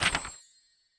mini Paytable Show; imperialriches

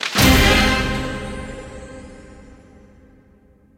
small win; hiddencoinsofegypt

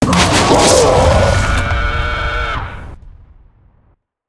Boss Die Volcano; wildworlds

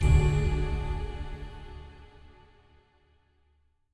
spin Button Quick Stop; fairyred

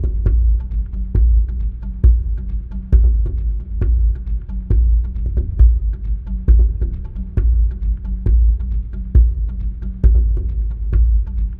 spin loop drums; rise of maya

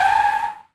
car break; cashnoire

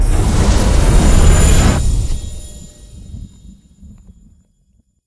near Win; riches of midgard

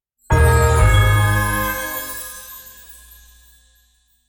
medium win; secrets of christmas

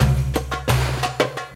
spingle Drum Loop; wild bazaar

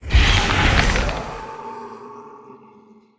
wild Activation; conan

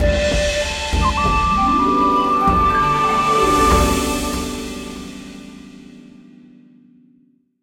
free spin intro; wild turkey

